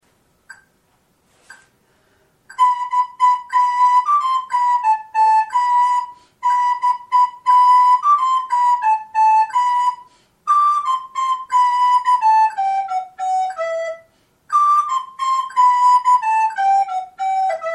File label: Gaillarde G Em, FLUTE